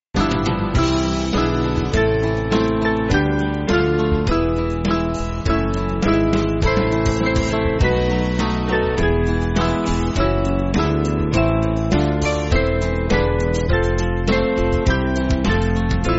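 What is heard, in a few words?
Music Backing Files for Churches; I Am Not Skilled; Keston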